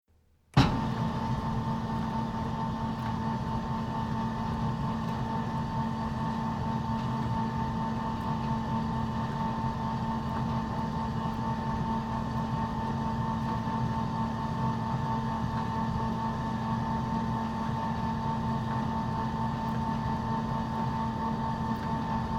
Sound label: Industrial Mechanical Large Conveyor Slow Speed Start Run Stop; Conveyor Belt